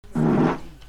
nuclear fart

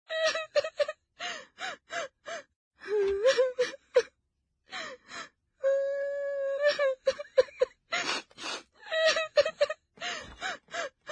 crying

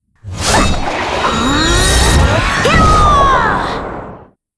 storm

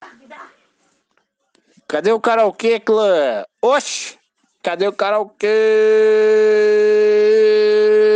karaokeeeee

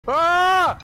aaaaa

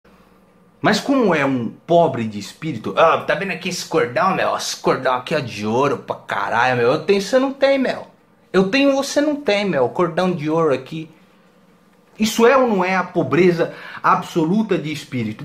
ringtone; podia ser pior

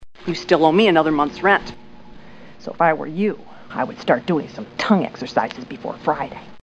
tongue

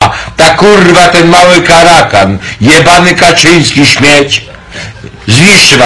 bass boosted

